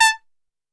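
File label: A2 trumpet shot, Brass Super Section; Best Service; TRP SHOT; 90 s Sample CDs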